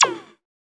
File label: BOING; Distored Reality